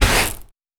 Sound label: Sand gravel scrape